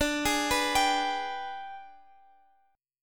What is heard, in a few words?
G sharp Minor Major 7th Flat 5th